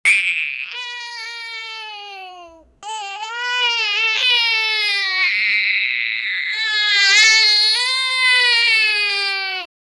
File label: child crying, unhappy